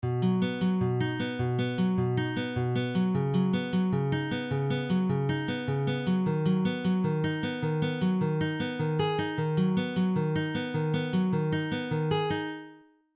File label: Harmonics